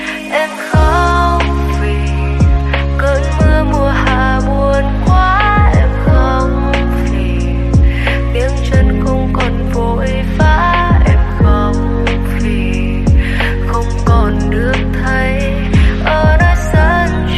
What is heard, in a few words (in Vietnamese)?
Nhac Chuong Lofi